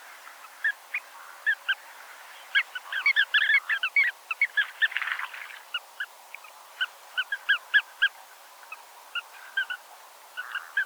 islandskiy pesochnik golos

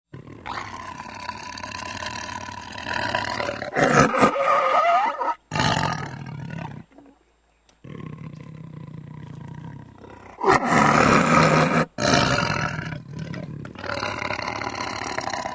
tigr golos

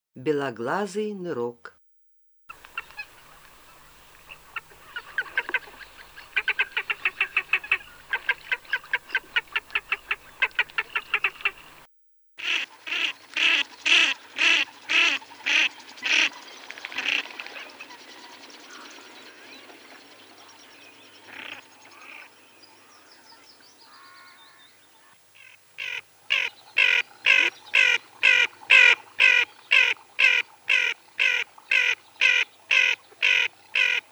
beloglazaya chernet golos

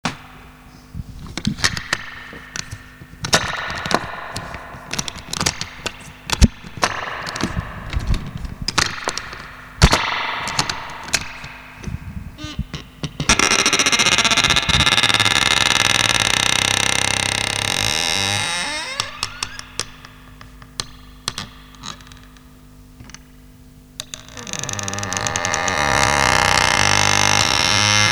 zvuki razdrazhajushhie

